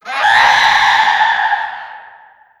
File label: scream; hallow; dota; conquest